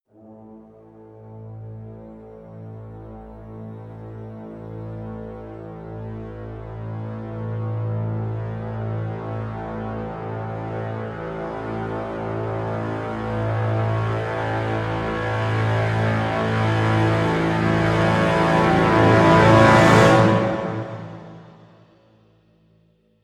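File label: crescendo brass swell lo A; brand x music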